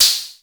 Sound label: TECHNO PERCS; Crypton - DrumBase5000